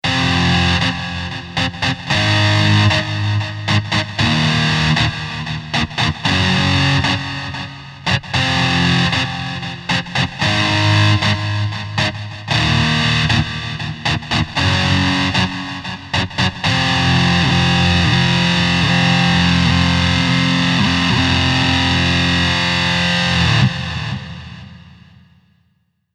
rockin delay; fantom xa

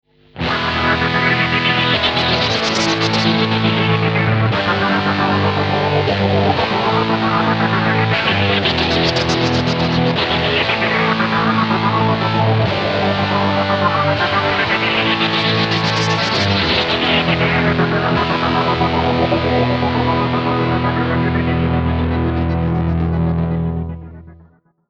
slicing mix

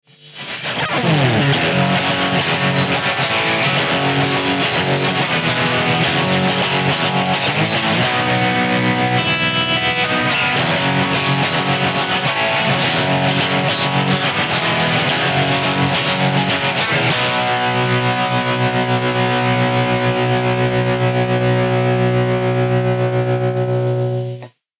sync tremolo

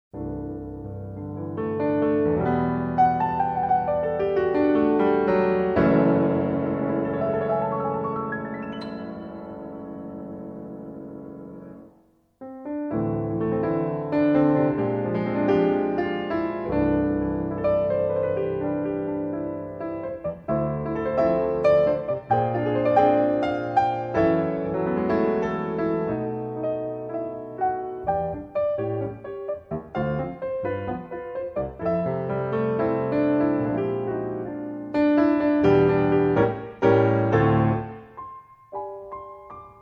piano

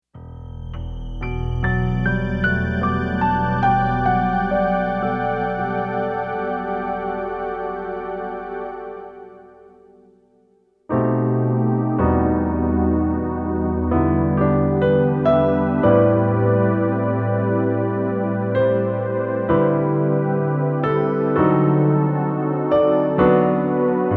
piano pad

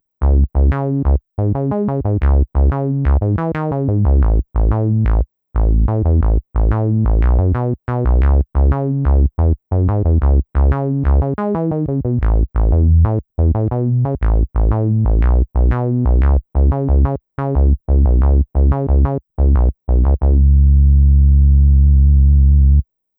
synth bass; ax-09 special tone 3

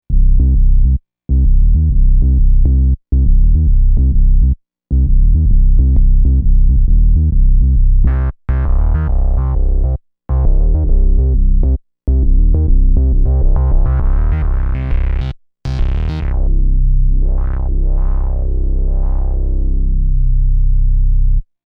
synth physical bass

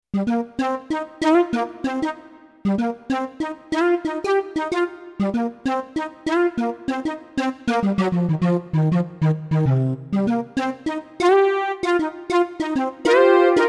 vc 1 flutish brass

